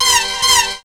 HIT, STABS, House

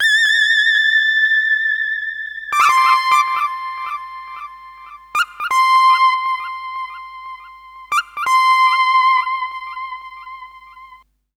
SYNT; USB Soundscan vol.31; Miro s Grooves; AKAI

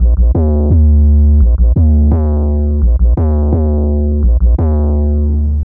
Psycho Bass; Fluid Dynamics

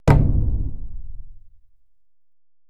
PERC MIX

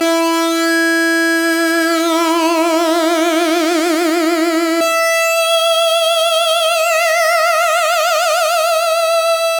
E Phrase Static Drama; Zero-G; Future Wave

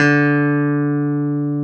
D3, CLAV; Sonic Reality; Vintage Timetraveler; CLAV PIANET

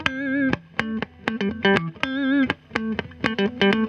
GTR, Roots Disco; USB Soundscan vol.32; AKAI

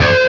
HARMONC DRAG, Guitar; East West; Steve Stevens Guitar Samples Collection

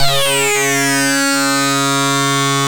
ARP Synths, RUMBLE REZ C2; E-MU Formula 4000 Series Vol. 3; Analog Odyssey

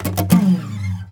STRUM DOWN, Nylon Guitar

